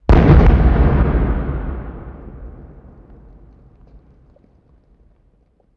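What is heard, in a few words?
explosion distant; ModernWarfare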